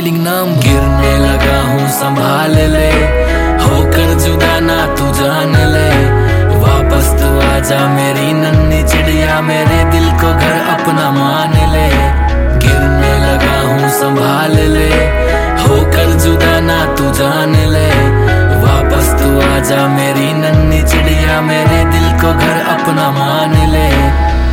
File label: Ringtone, Punjabi